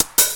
closed open hihat

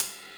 CYMBAL, Yamaha RM 50

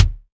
Kick Dry

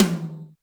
Yamaha RM 50 Toms

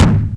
Bassdrum, Yamaha TG-33; Drum Samples

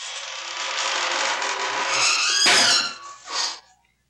Squealing Gate; Sound FX

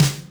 snaredrum, Yamaha RM 50

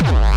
bombo, kicks, Hardcore

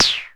Snaredrum, Roland System-100; Drum Samples

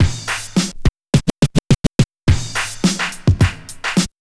Scratch

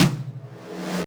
Yamaha RM 50 Toms

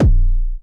kicks, Bass Drum

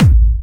Medium, kicks

